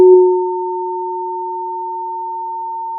pop, Sound of a church bell

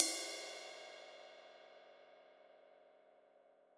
Trash F, drum

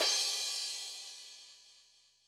Crash, drum

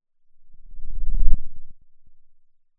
Sound of a lion’s roar

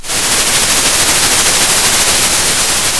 Sound of a thunderstorm

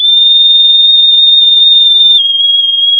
pop, sound of a tea kettle whistling

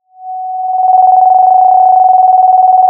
Sound of a phone ringing

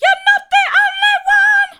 hard, vocal

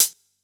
Elec Cl Hat, drum

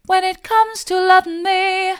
when it comes to loving me soft, vocal

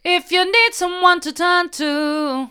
mid, vocal

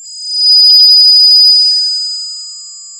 Sound of a tea kettle whistling

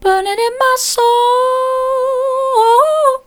burning in my soul soft, vocal

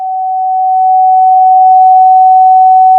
Sound of a police car siren

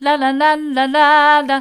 la la mid, vocal

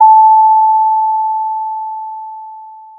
pop, Sound of a doorbell

